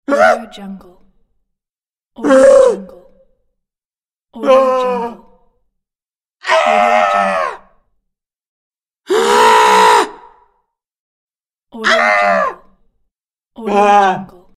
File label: scream